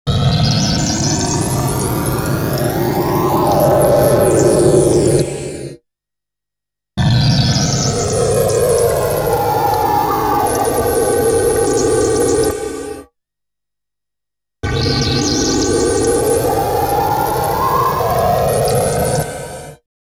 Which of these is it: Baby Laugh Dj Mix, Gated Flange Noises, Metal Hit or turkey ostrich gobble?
Gated Flange Noises